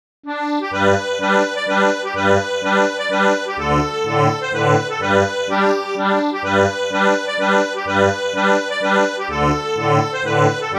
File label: Mazurka; Lapleau